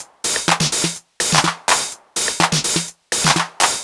drum switch top 125, Classic Chicago House Drum Loops